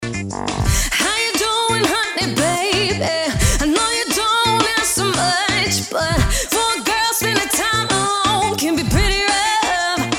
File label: jazz